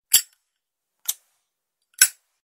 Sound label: zippo lighter; فندک زیپو